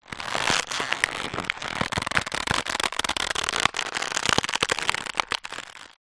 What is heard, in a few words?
plastic packet squeeze stress; foley